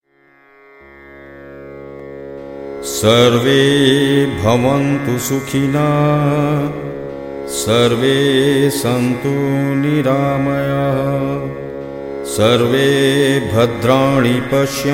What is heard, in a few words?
shri surya mantra